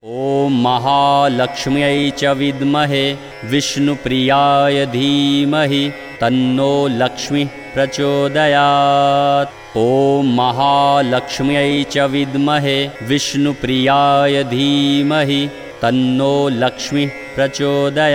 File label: laxmi gayatri